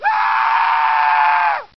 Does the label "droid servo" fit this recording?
No